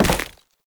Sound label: Step Ice; Warframe; Oraxia; Foley